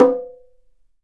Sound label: Bongo Low; Multisamples; Digital Sound Factory